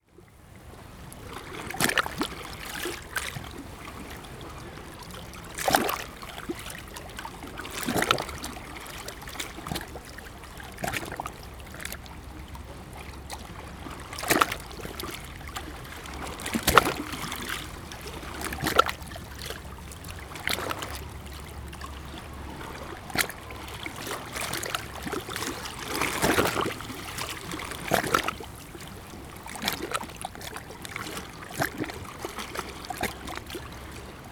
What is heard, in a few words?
Shore Rocks, Water; BoatAttack